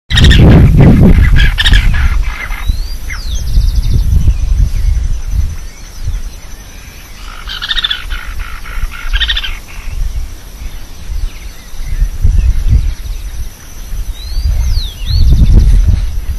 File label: Porzana spiloptera; Punta-Piedras; Jorge La Grotteria